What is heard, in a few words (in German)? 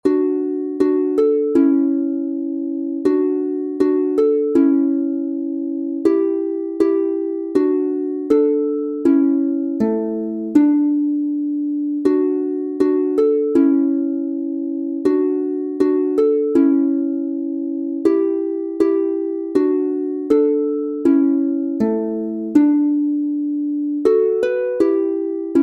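F Dur 2 stimmig instrumental, Harfe; Kinderlieder; Kindlein mein schlaf doch ein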